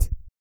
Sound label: HICCUP; EURO HICCUP; Spectrasonics; Vocal Planet; Blues Soul